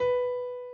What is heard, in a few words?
key